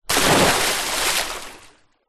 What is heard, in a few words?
sport child swimmer jump into pool